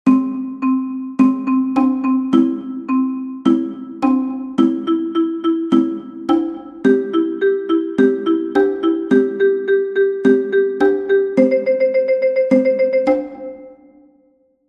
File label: Cajon; Tick tack